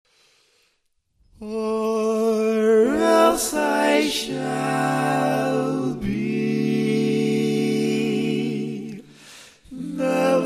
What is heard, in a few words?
Lead, barbershop